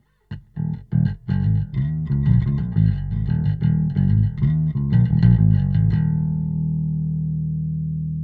bass; Analog Pro

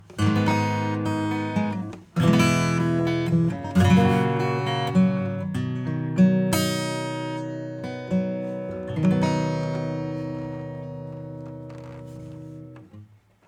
acoustic; SplitEQ

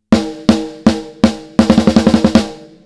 Snare

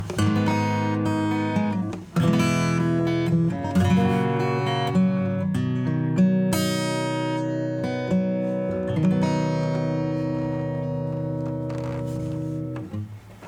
Clean guitar

